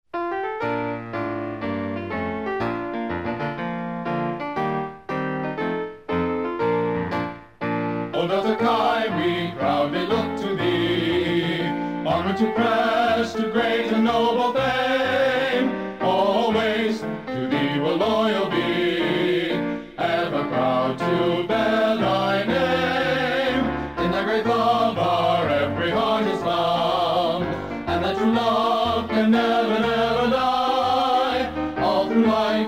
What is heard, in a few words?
Marching Song; Delta Chi